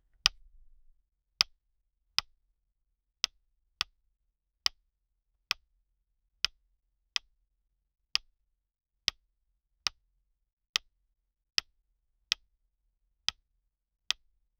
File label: clicking wood button